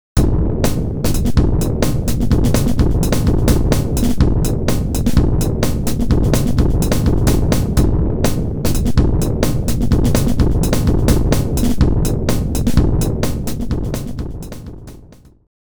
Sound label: kill that kick; u-he uhbik